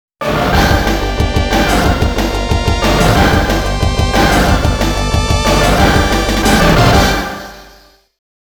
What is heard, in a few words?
Mission Clear; Mission 3 Clear; TAITO SOUND BULLETS (2025)